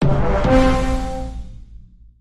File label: fanfare; kak priruchit drakona; vysizhivat yajtsa